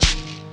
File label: Snare Synth Shake; Lost Tapes From Mars